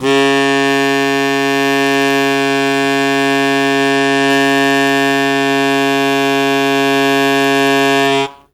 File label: Loud Attack Sustain C#2, Harmonica; Zero G - Harmonica